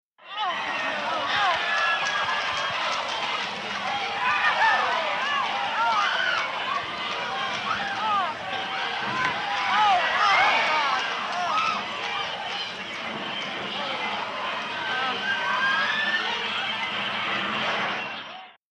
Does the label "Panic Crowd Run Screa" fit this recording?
Yes